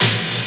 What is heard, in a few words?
HAMMER, SYNTH FX; AMG - Vince Clarke Lucky Bastard